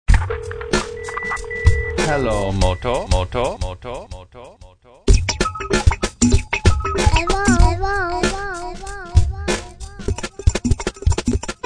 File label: Hello Drums; Motorola E398; Hijacked by MTV